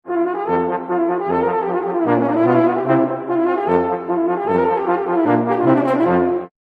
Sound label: horn; Mozart